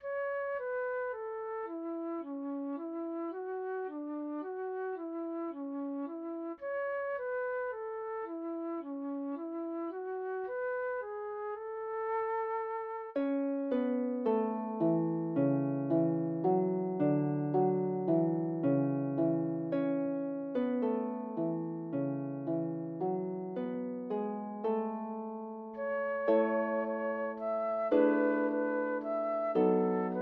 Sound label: Folk Songs blow the wind southerly, harp; Folk Songs from the British Isles Vol 1